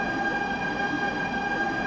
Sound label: Motor, Train; OpenBVE